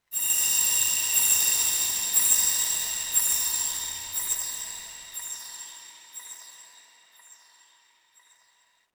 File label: Oneshot Synth